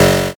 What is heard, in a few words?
zap